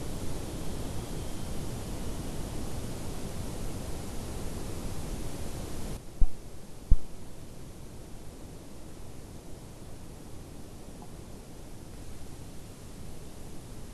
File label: WFR audio emfisis